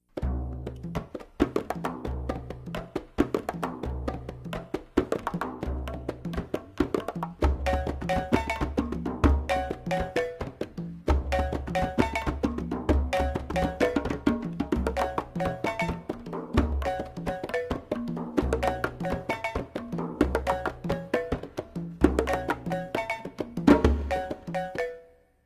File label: Percussion Song